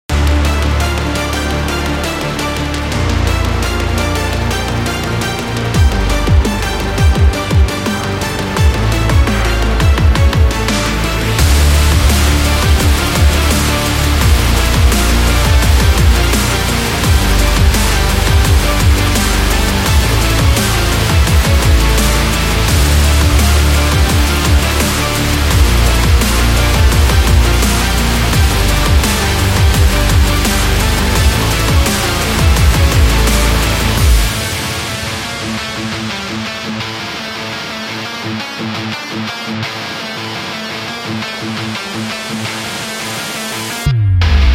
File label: Powerful Epic Dubstep; epic sport metal